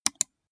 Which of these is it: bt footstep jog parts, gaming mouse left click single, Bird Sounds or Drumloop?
gaming mouse left click single